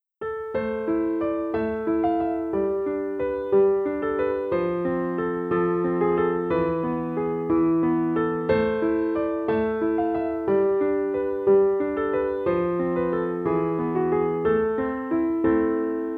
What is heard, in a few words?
Traditional Greensleeves 60BPM